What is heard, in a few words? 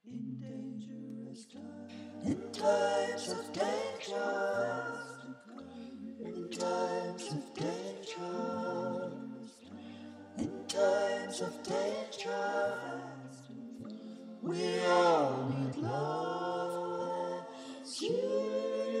chorus both backing